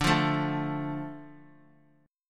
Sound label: D Minor D, chord